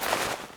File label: melee inspect movement, weapons; tf2classic